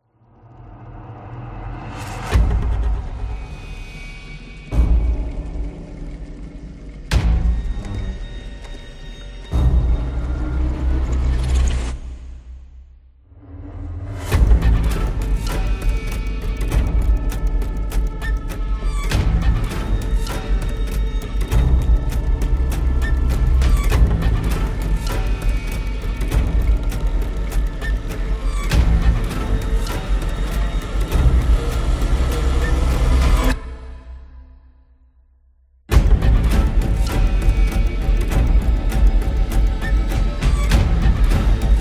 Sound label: Suspense Trailer